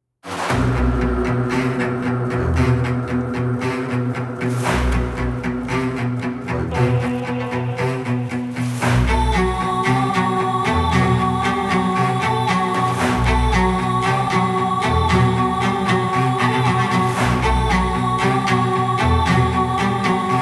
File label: Boss Fight; prince of persia the lost crown gamerip 2024